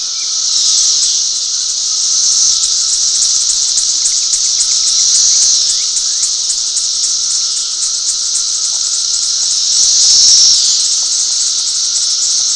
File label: deculachor, Periodical Cicadas